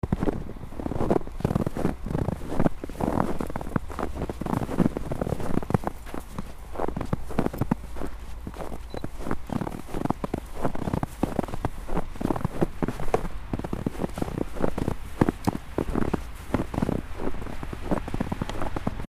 marcher dans la neige

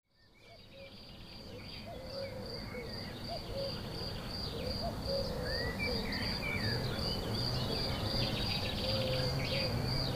Grillons oiseaux grenouilles; MZ-N710; la Plaine Tonique

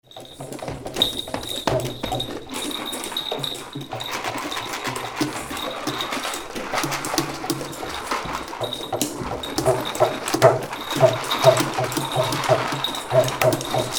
ensemble

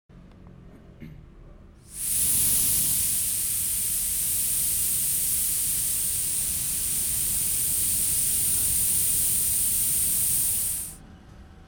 station gonflage pneus

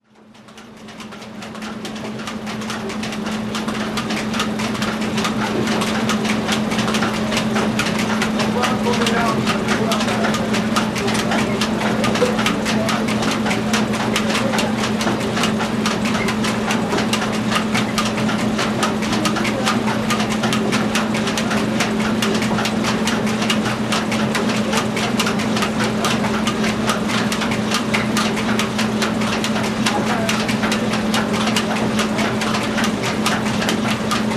Scierie; MZ-N710; Scierie Rosset

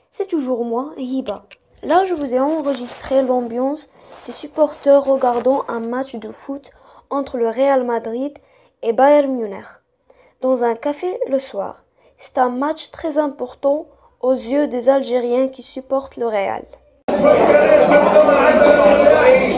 ambiance supporteurs, Oran

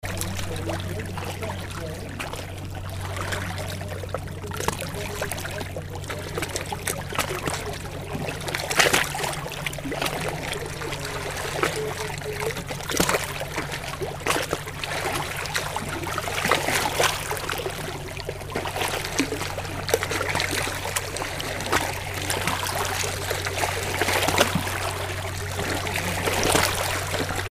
clapotis lacustre

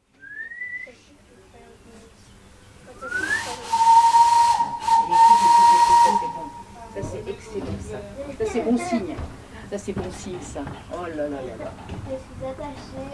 Train à vapeur; MZ-N710; CFHR

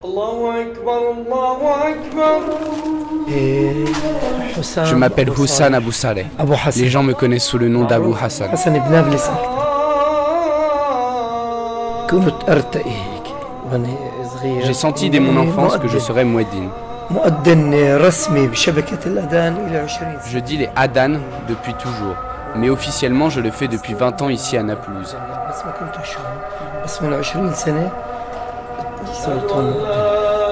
le muezzin de Naplouse

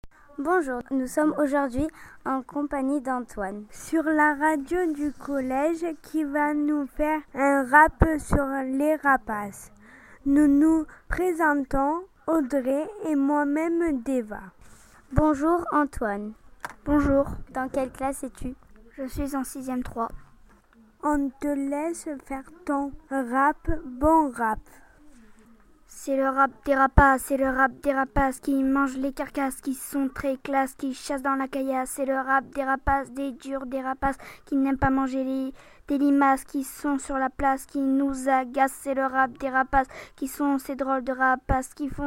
rap

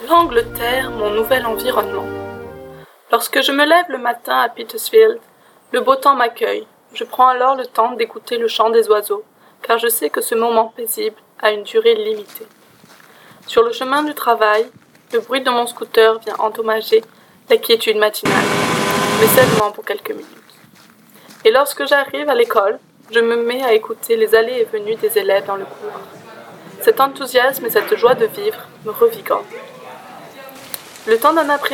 Carte postale sonore

